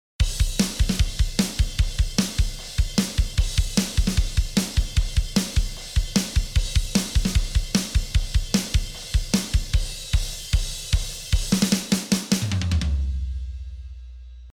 drum off

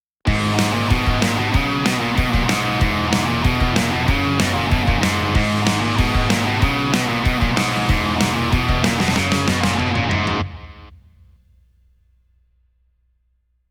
drum master off

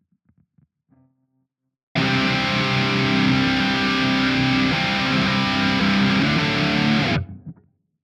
guitar off

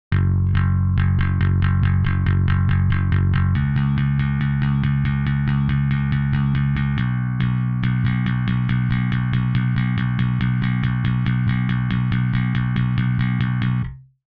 bass off

